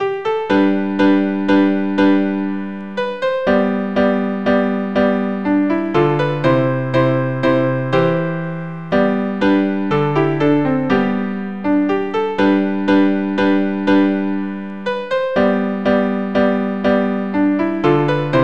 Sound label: trio; barley break